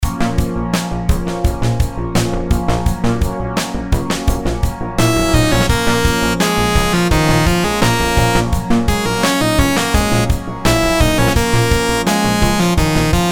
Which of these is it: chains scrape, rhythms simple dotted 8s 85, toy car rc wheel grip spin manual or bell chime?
rhythms simple dotted 8s 85